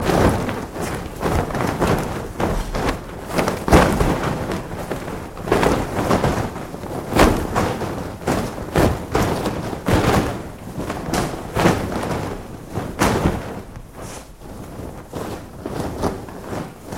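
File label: sounds of parachute jump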